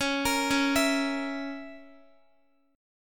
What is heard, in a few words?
Db Minor 6th Db down Guitar; arpeggiated